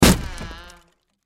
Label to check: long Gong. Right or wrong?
Wrong